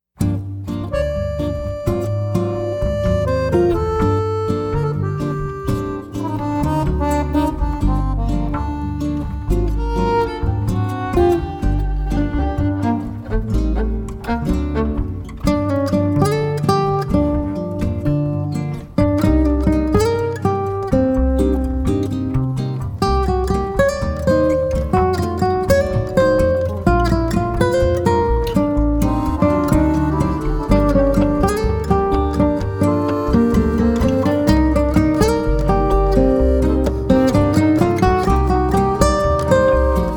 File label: Bossa